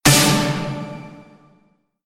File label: word success pinch; typing; tf2ware ultimate